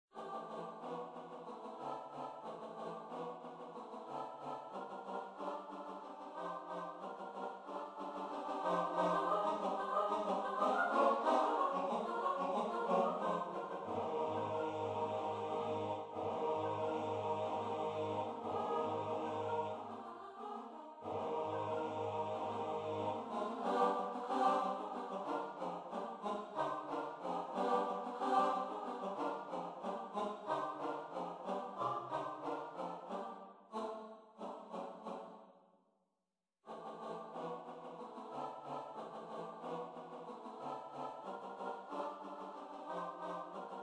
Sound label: choir